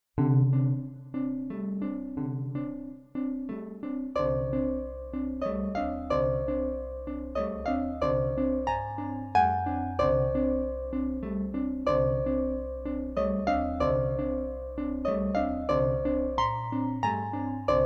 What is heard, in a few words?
Arpa